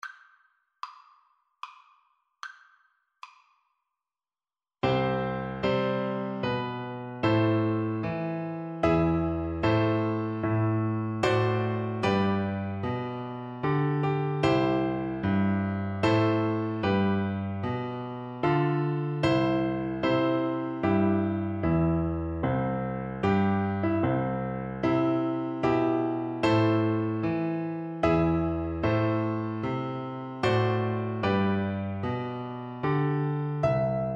star spangled banner, Recorder

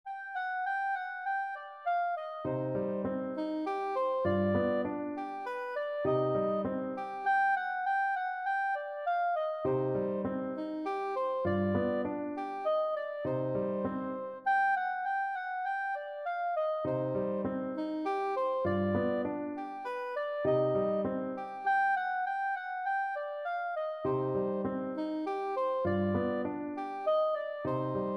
fur elise soprano saxophone; beethoven

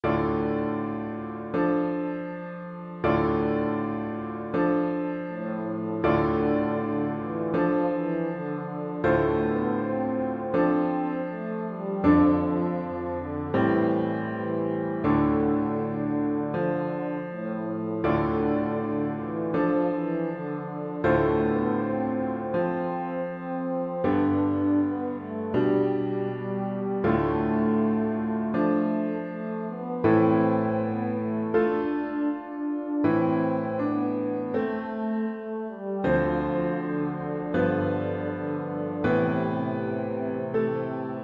EUPH, euphonium

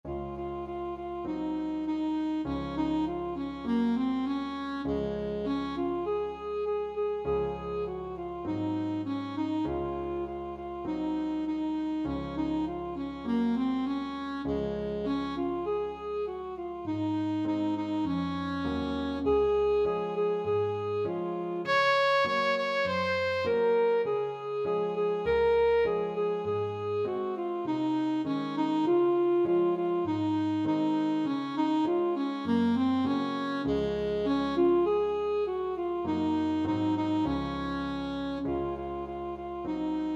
waltzing matilda, saxophone

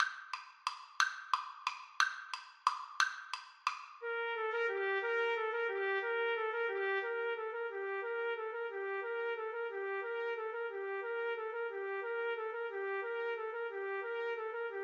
trumpet duet

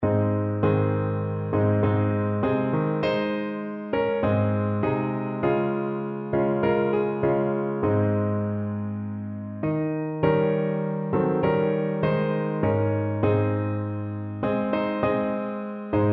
love to tell, piano

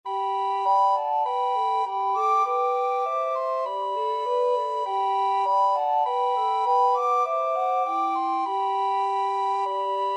angels, recorder trio

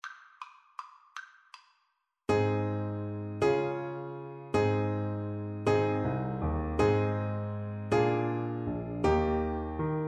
my bonnie, Saxophone

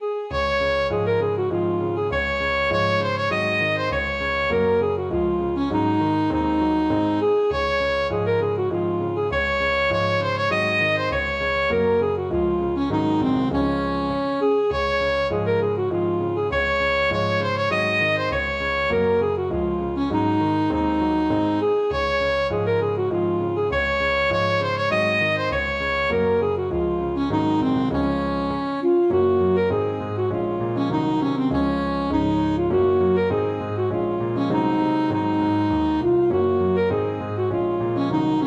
alto saxophone, polska

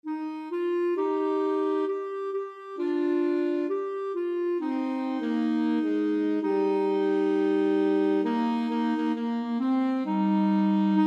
clarinet saxophone duet

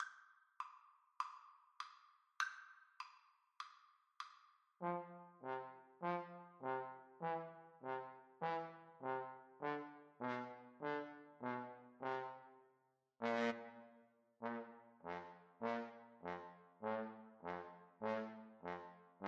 Trombone Duet

